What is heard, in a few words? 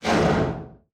distance swarm scroge missile hit, weapons; Stellaris